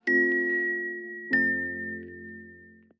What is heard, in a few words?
Power Off; Plus GSM Poland